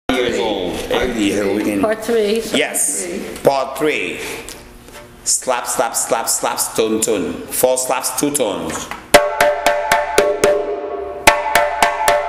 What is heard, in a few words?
SLAP SLAP SLAP SLAP tone tone - and all together